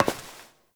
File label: Step Metal; Warframe; Koumei